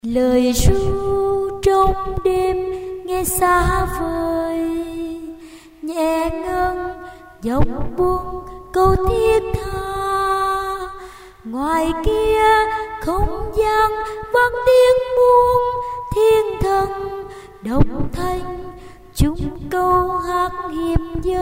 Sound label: sop alto